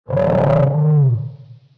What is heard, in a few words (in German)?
schaden; Barotraumatic; Razzershrimp